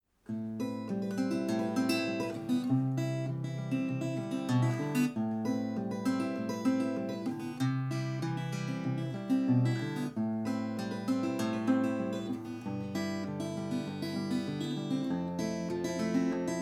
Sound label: Framus Parlor Model FP-14SV VSNT Fingerpicking